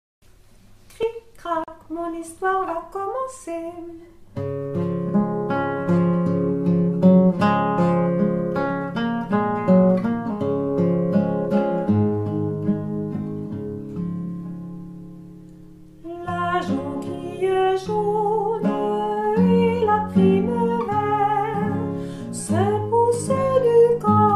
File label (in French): Chanson